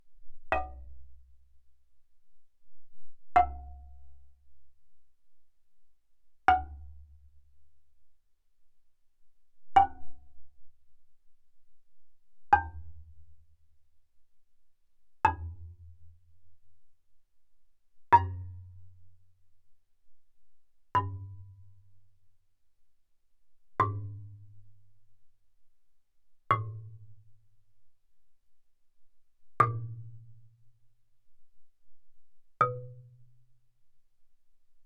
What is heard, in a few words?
Marimba deadstroke ff C2 B2; Percussion